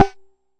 Jomox MBrane, drum machines; Jomox MBrane 11

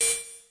NOISE pitch Hifi, Roland TD 7; DRUM MACHINES

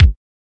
kik

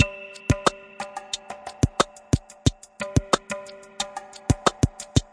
090 tabla ping ambient beat

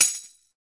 classic hh Thk Tmb, Classic Hip Hop; STYLE KITS (Hip Hop, Etc); Classic Hip Hop Mega Kit

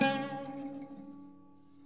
luth; GUITARS

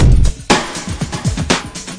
120 overload break, drum loops

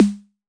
noise snare; single hits

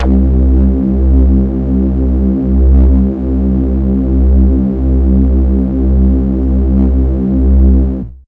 synth

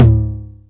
909 Lo Tom; DRUM MACHINES; 909 Extended

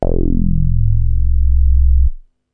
tight square C2, Tight Square Bass

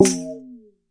Processed Ethn Perc, ETHNIC and WORLD PERCUSSION; Doru Malaia Percussion

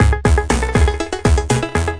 120 melody beat, drum loops and breaks; 111 to 120 bpm

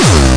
obnoxious kick

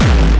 absolutely insane kikdrum; Kicks; single hits

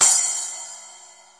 SPLASH, Big and Heavy Real Drum Kit; REAL LIVE KITS

